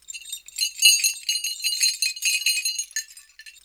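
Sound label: Tanzania bells; Roland LCDP14 Africa VOL-2; Perc FX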